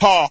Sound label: ha, ooh ahh